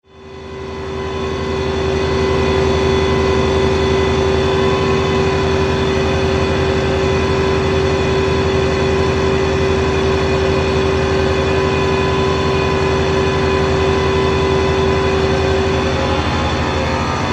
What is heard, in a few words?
organum electronics; Siren Records